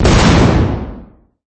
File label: big bang; LiveOrDie